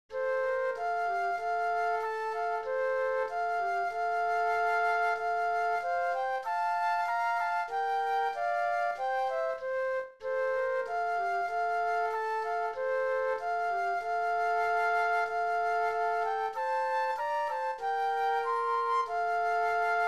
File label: Flute Duet; christmas